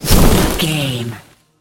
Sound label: nature spell vines blast impact